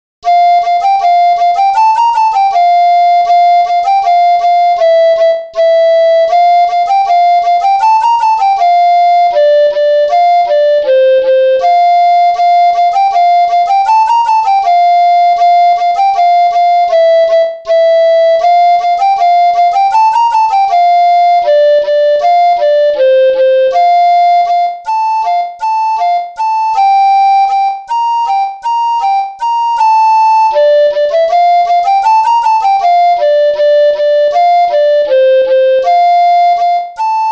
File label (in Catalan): Ball Ingles vell (flabiol facil sol); festa